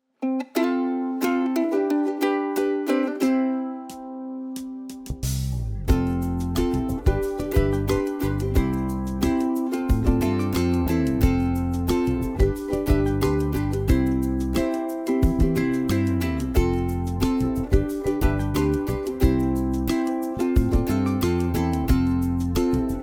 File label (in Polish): instrumental; pakiet organizacyjno porzadkowy; wersja instrumentalna